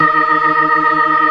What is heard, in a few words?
organ, all stops; Keith Emerson Signature Series